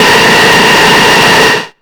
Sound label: TRASH WIND, Hard & Loud Techno; USB Soundscan vol.01; AKAI